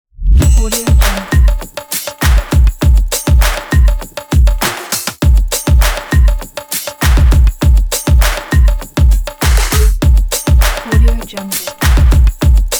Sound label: drum beat